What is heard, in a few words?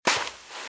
Footsteps Sand Dry Walk Left; RadwinIsland